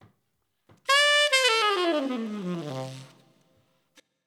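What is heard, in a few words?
Down The Scale 8 beat D major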